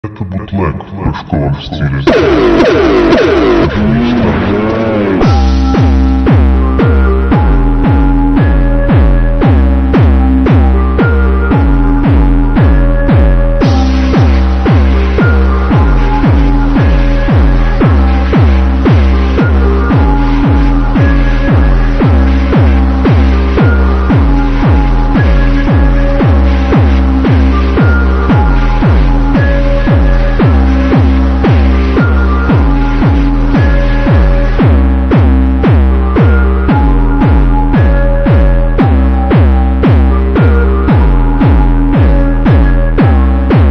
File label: jumpstyle bootleg super slowed